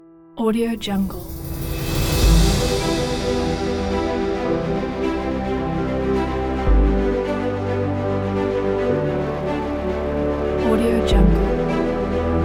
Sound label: epic for background